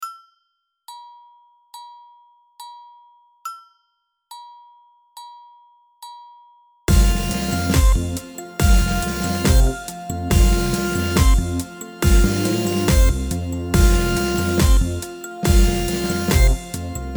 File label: Funk